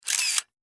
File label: 35mm camera shot